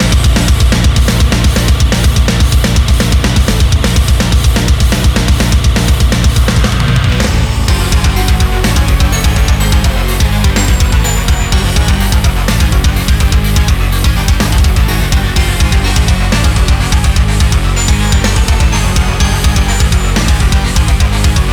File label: Boss Organic loop; stardust galaxy warriors stellar climax switch gamerip 2018